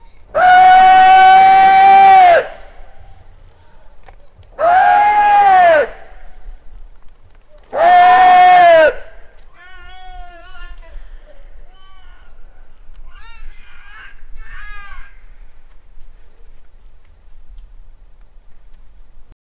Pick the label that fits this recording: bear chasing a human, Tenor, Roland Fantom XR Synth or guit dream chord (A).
bear chasing a human